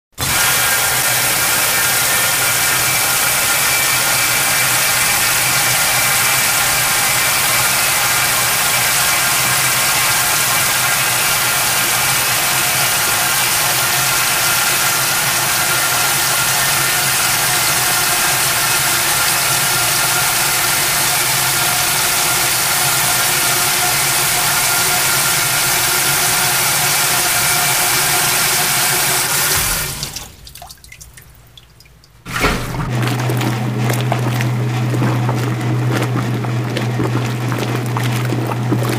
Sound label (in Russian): zvuk stiralnoj mashiny